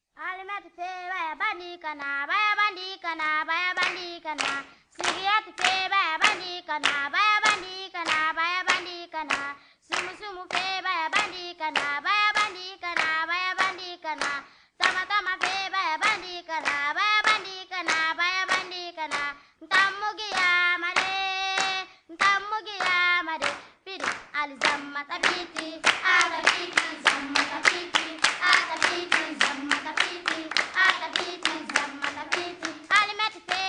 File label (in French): chant d enfants; Ecole Maternelle de MORESTEL; Toute l'équipe de l'école vous souhaite une bonne rentrée.